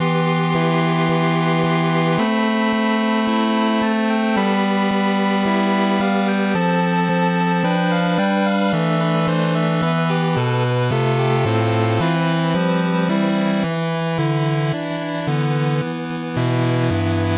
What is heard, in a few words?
Village Music; timothy and the mysterious forest ps4 switch windows gamerip 2019